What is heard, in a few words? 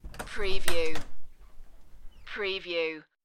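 Open Front Door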